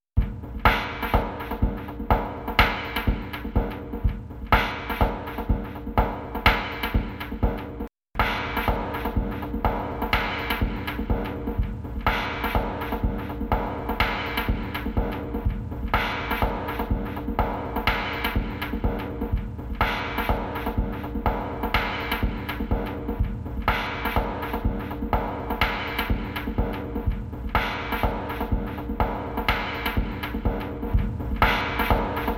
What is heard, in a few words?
Drumloop; PRO C2 STYLES